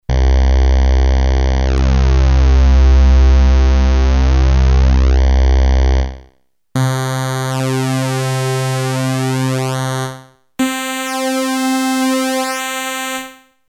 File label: rectangle width range; Red Sound Darkstar; H8/3002 family (16/32bits) + dedicated audio DSP ADSP-2185